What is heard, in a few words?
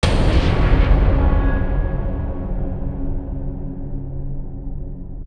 Hits [Creepy]